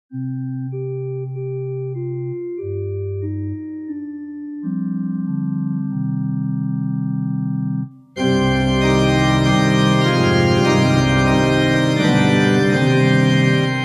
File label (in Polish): hejnal wszyscy